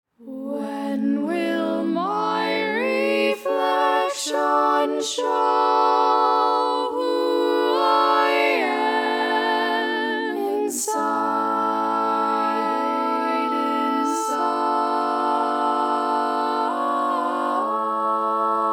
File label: All Parts, barbershop tags